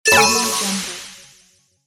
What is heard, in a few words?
cartoon ui signal bonus